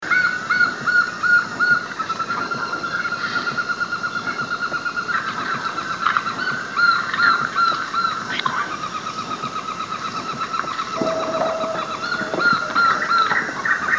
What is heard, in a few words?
Funisciurus pyrropus, Ghana; Atewa; Daan Drukker